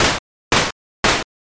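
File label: footsteps water walk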